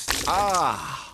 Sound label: Ahhh